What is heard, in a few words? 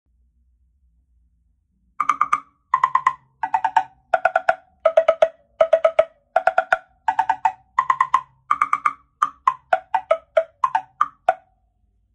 temple blocks